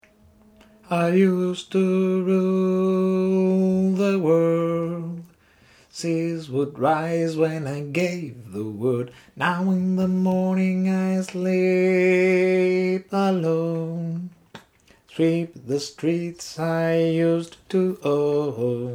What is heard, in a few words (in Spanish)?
tenor, coro